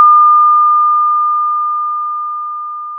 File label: Sound of a school bell